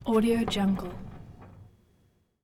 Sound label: microwave oven door open close movement; domestic sounds